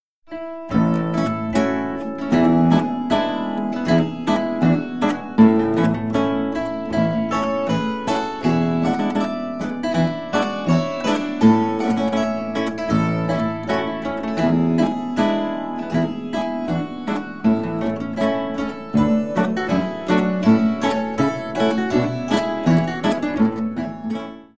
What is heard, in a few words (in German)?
Saitentrio Schottisch